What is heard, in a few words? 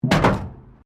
METAL BIN IMPACT PLASTIC CRASH BARRIER